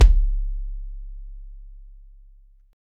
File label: kick drum